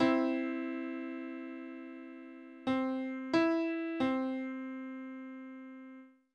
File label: Major third on C